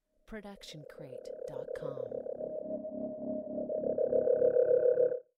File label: Robotic Motor Charge Up; SoundsCrate